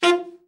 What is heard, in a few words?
ALT SRT, ALTSAX SHORT; Giga Samples Collection